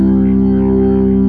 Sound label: B3 C 3-3, ORGAN; AKAI S-Series CD-ROM Sound Library VOL-1